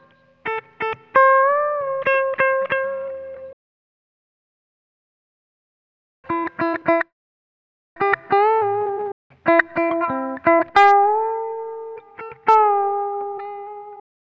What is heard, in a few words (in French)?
GRATTE pour piano